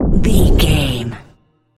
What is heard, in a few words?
bullet leave barrel slow motion